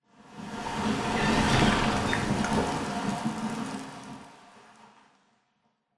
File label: Explosion Rumble Interior Close Debris; Boomtown; Titanfall 2